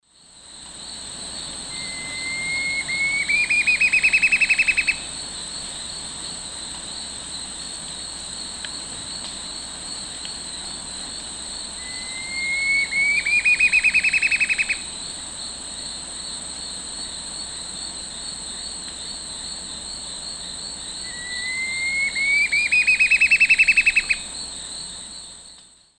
Campylorhamphus procurvoides, song

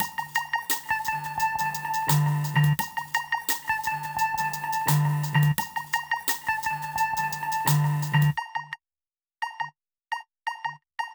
Old Shizzle, Hip-Hop, 86

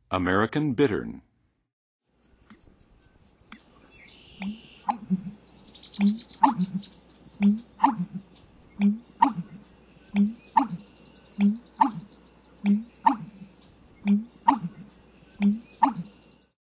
American Bittern, Songs of Birds of North America; Western USA; Western USA Songbirds

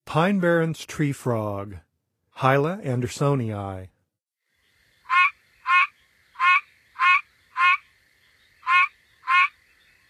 Pine Barrens Treefrog; North America; Songs of Frogs & Toads of North America

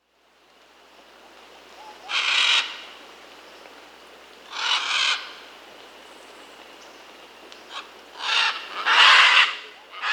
Scarlet Macaw (Ara macao), Peru; S. America

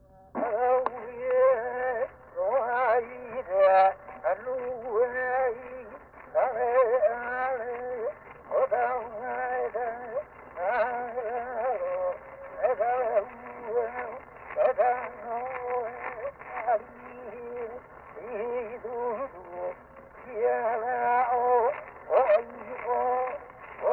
Dog dance chant, Hawaiian; World Music; Na Leo Hawai'i Kahiko - Voices of Old Hawai'i

